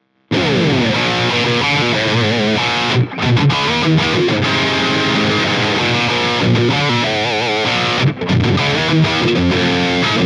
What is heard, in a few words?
S Standard Heavy, ibanez s series guitars; s6570q prestige